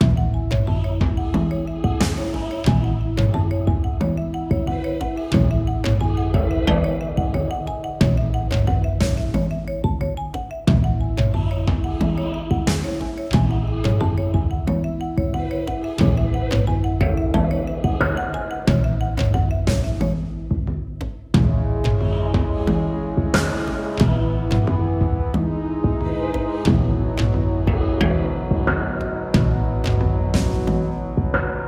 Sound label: Fishing Village Loop